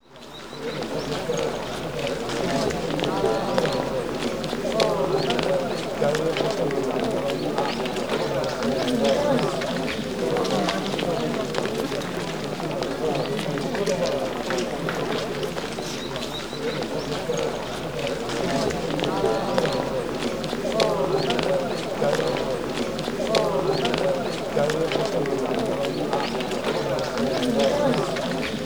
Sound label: Ambient BGM